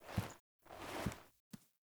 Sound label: Foley Quest Handshake; Orlick; Friendly